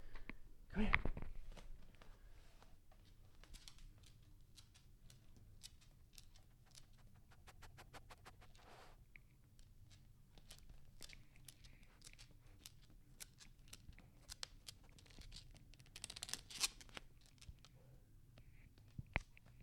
Dog walk sniff

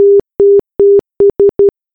Blip

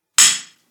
Pickaxe

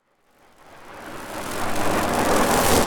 Cinematic Riser

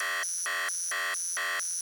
Interface Alarm Warning Signal; Ui alert

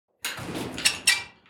Metal Hinges Rumble

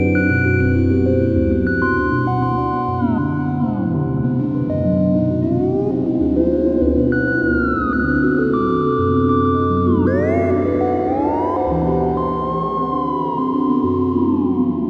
Broken Dream, 99bpm

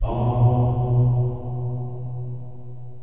Bow

